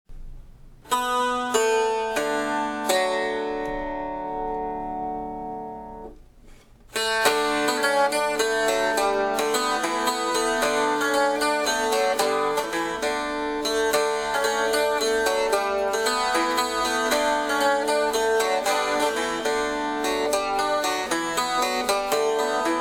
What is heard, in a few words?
tenor cittern example